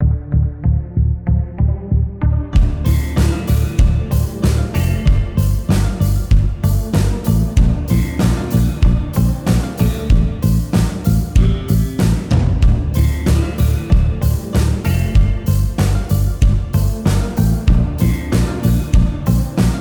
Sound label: Modern Vintage Tension